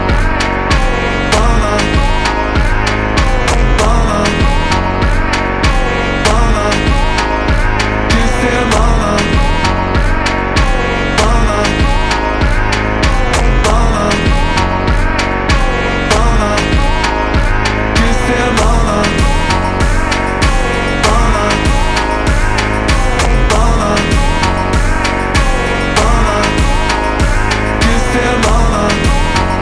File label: remix